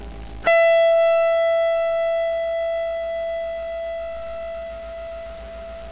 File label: guitar E5 alt dist